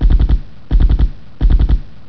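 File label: machine gun; kabul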